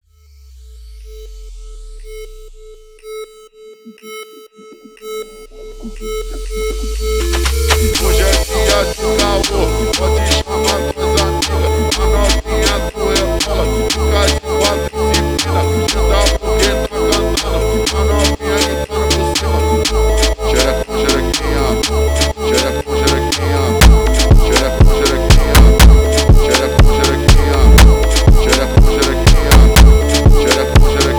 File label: slowed